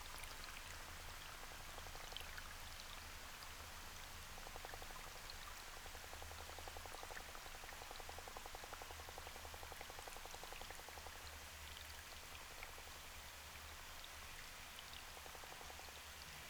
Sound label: knocking, hydrophones